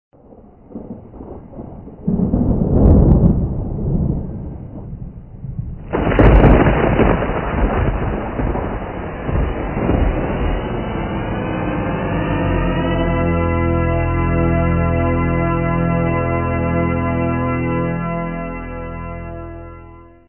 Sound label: Thunder Storm